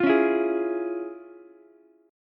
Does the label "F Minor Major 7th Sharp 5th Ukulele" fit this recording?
Yes